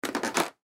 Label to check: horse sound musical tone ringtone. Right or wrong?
Wrong